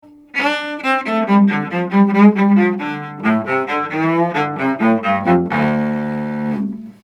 Cello; avantone ck 7; Fig. 8

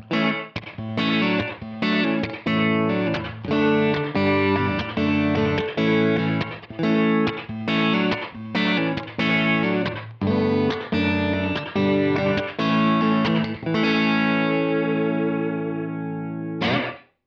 Reverb Reflections

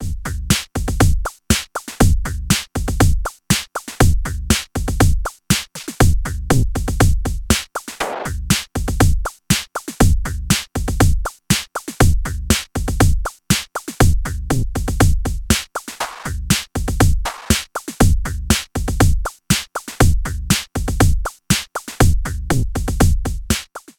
Transient Plus RING Mod by OSC1 CV; Future Retro

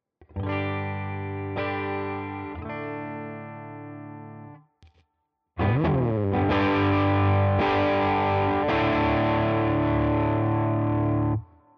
TARS Drive Stages 9 to full

